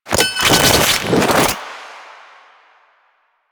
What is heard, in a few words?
Grenade Prep; Warframe; Lasrian; Lasrian Soldiers; Weapons; Lasrian Goonadier Grenade